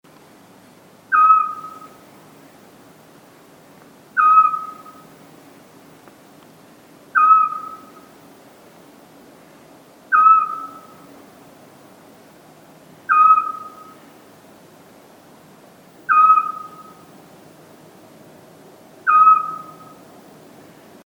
petit duc scops

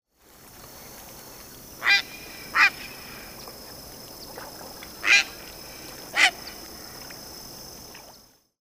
grand heron

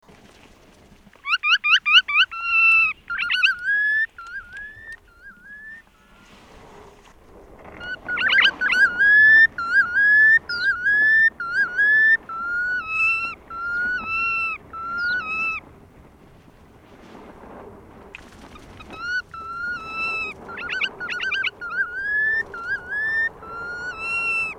becasseau maubeche, calidris canutus roselaari

